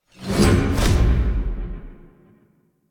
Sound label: Cast; Enemies; Painful Reprisal Cast; DE; Warframe; Warframes; Pax Duviricus